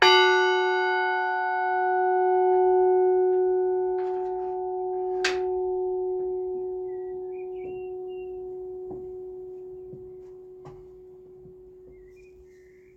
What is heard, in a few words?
one, bells; billingshurst